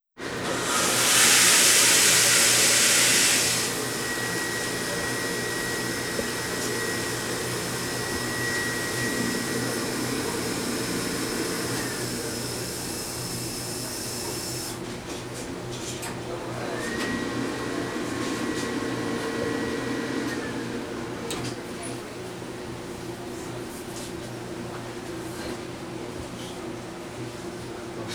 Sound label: Public Toilets, New York City; Sounds Visual; The Sounds of New York City